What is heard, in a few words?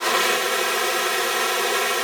WEQS D4, ALTOSAX; Xsample VOL-2